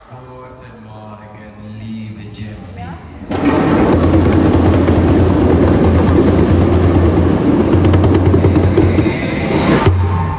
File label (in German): guten morgen liebe djembe